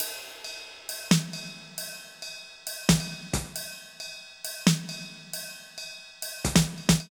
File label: Beat Ride & Snares, 135bpm; Zero-G Sound Sense Grime Scene; Construction Kits; A Positive Vibe